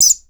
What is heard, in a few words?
bird calls, percussion